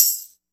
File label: Tamb; Soundtrack Loops Jazzy Chillout; One-Shots